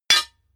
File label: hammer hit metal clank